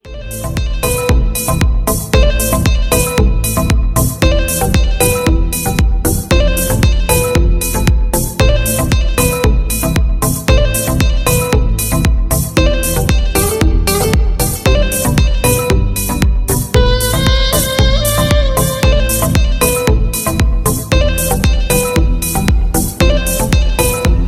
enthusiastic ringtone; samsung ringtones

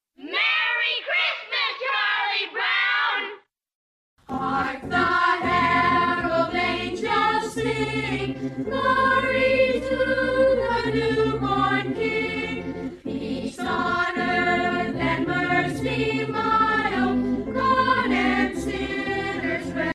nostalgic christmas ringtone

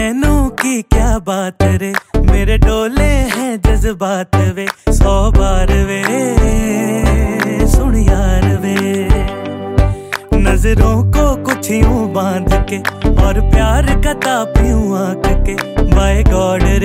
ringtone bollywood ringtones

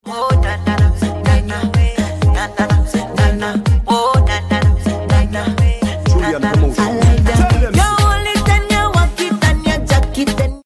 octave ringtone

